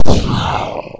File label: pain; player